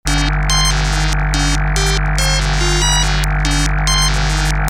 SCIFI

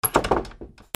DOORS

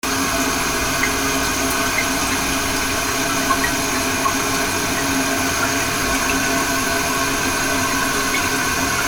INDUSTRY

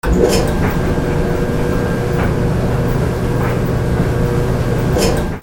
HOUSE

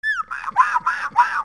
CARTOON

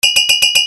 BANGS

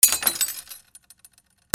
BREAKING

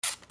CAMERAS

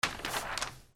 PAPER

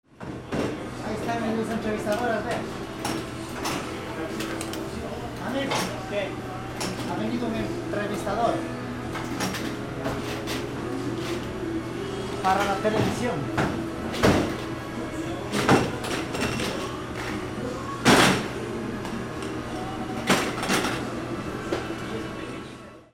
AMBIENCE

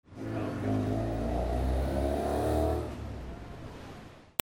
VEHICLES

